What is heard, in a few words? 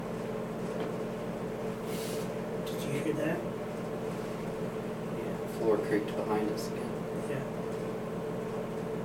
attic back creeking